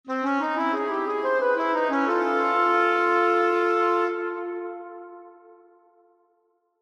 Woodwinds Exotic, Woodwinds Exotic Melody; Musical; Blastwave

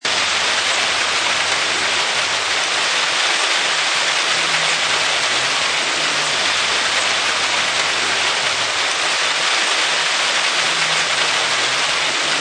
High Rain, High pitched rain shower.; Weather

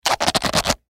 Rubber Stretch, Rubber Stress Stretch Rub; Miscellaneous Office Sound Effects; Blastwave; Foley

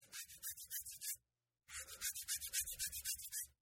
Scratch Arm, Man Scratches His Arms; Miscellaneous Human (Sound Effects:Human)

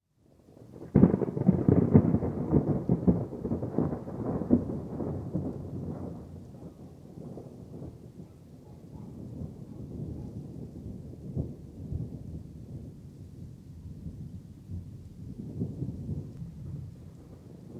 Thunder Clap Electric Storm; Various thunder claps and rolls with very background dog barks; Thunder Sound Effects

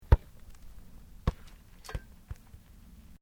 Sport Soccer Kicked Into Net, Soccer ball sounds

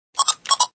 Electronic beep from handheld device; Beeps; Sound Effects:Multimedia

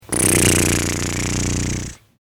Vibrating Lips A Pretend Helicopter; Miscellaneous Cartoon Sound Effects; human sound effect

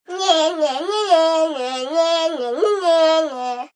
Kids Nya Nya Nya, Male, child; Talking Sound Effects

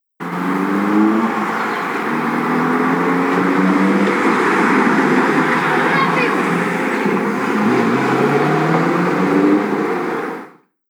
Passing Car With Urban Town Ambience; Outside Ambience Sound Effect; Traffic And Car Sound Effects; Andrew Fleming

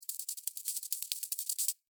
Beetle Walk Sand, Beetle, Walk, Sand, Insect, Bug; Beetles; Animals:Insects; Blastwave

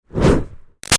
Fire Torch Whoosh Fast Short; Flames; Objects; Big Room Sound

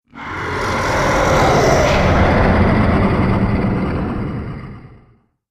Short Demonic Lair Ambience, scary soundscape

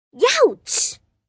Pain Yeouch, Ow, Ouch, 1 girl, dramatic; Voice Box

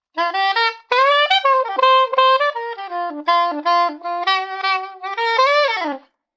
Cool Sax Riff, A cool saxophone riff; Horns; Musical

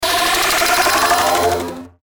Sweeper Fuzzy Digital Delay; Production Element Imaging Element Accent Transition; Sweeper Sound Effects

